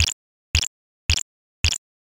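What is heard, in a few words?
footsteps grass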